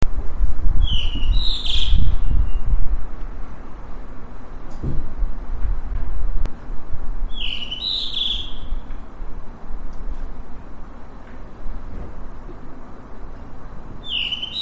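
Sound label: blue rock thrush; isohiyodori; kubo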